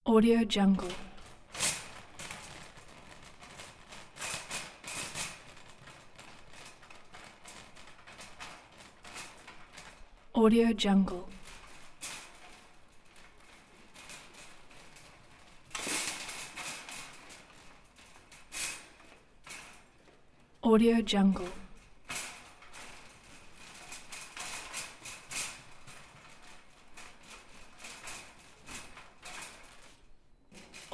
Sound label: supermarket